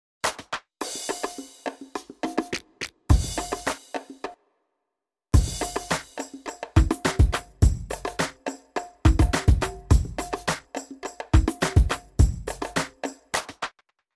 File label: beat